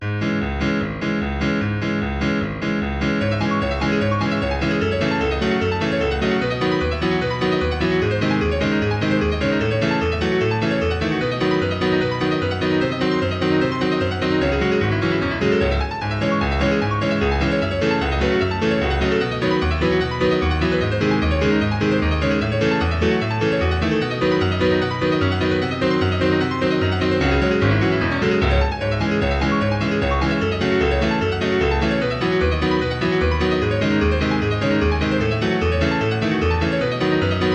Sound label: jazz